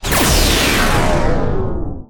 Space Warp; Space Warp Complex

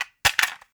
Cassette Rattle

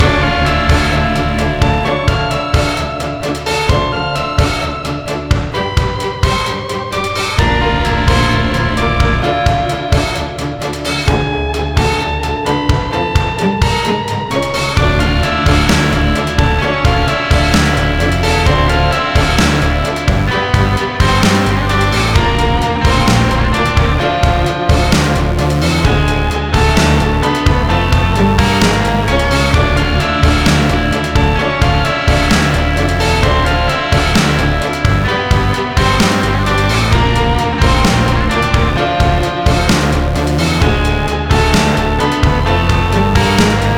dungeon combat; LFH dungeon1 combat WIP

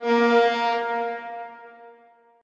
Aquarium Error

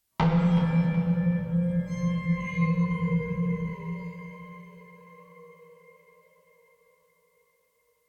Jingles

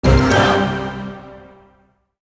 Army Change; mighty party 2017 gamerip pc ios android

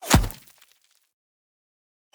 Hit Bullet Dirt

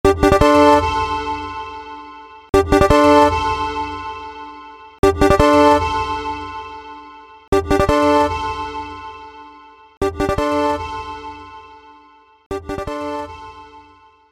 Level Up; Nexomon

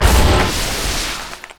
Robot Shatter

